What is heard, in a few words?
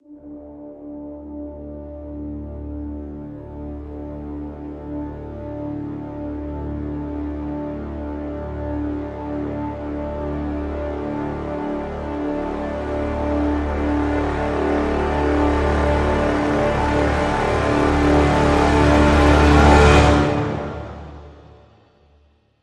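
Crescendo Brass Swell Full (D)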